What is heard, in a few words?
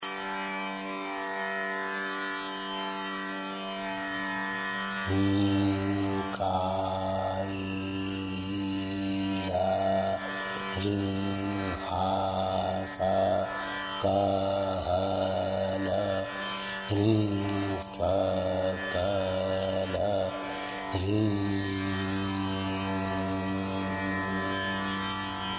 shodashi mantra; av yoga; mantry